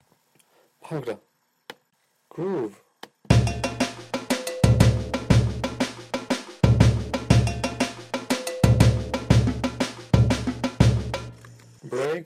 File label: Bhangra